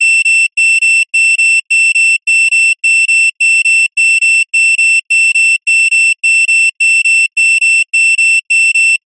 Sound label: science fiction console alarm beeps; zapsplat